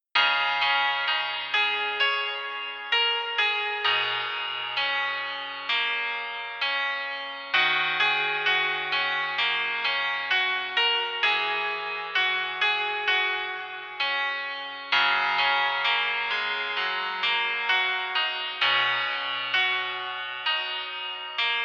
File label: weird chords